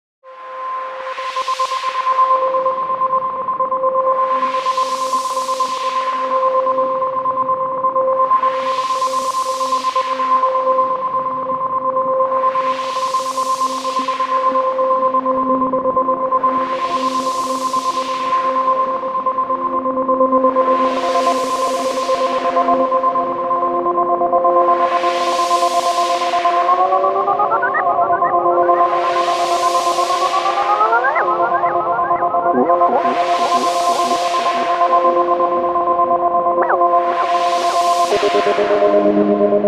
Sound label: Ultra Analog